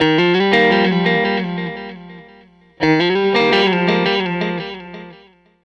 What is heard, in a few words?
Strat Guitar Loop